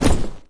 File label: Item Prop